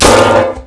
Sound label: bush; Mafie 1